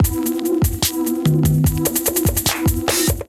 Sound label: Kit Melodic 146 bpm, Drums; MIDI Clips; Zoned; Ableton; Chop and Swing